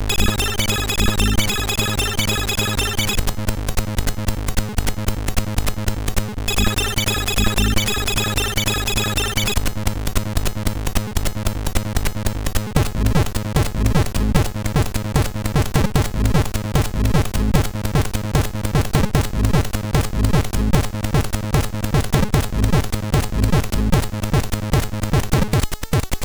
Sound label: sid MOS6581R4; Mew Face